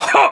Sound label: kiai; MonsterHunter; Fight